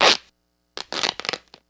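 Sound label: Cloth Rip Through Shirt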